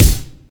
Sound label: shiny boom bap hip hop steel kick drum g key